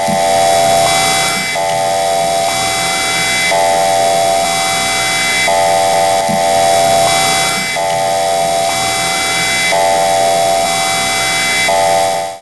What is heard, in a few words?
alarm